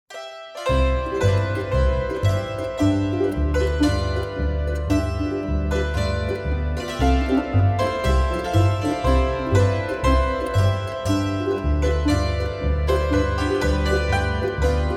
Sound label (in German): Polka Volksmusik Walzer